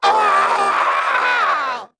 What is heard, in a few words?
HIT